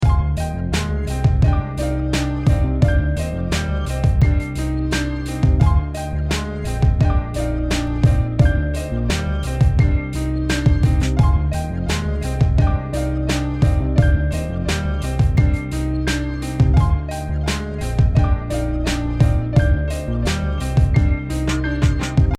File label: logic DMD RYTM